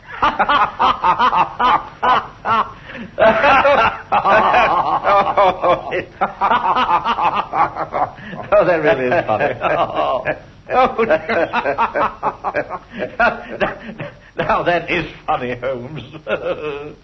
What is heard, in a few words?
laughs; watson; strange case